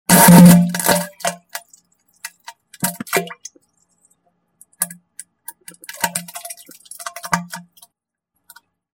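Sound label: sounds of diarrhea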